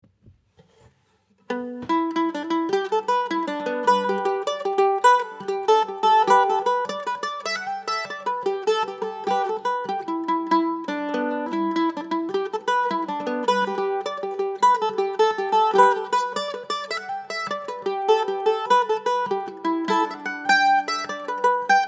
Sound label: mandolin